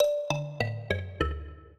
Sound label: minuet, mbira; Mozart